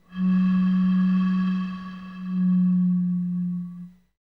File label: PRC BOTTLE, Bottle Blws